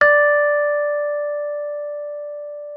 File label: MKFF D5, RHODES; Xsample VOL-1